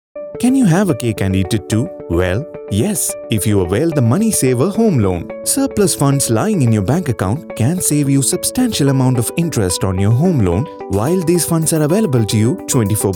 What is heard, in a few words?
music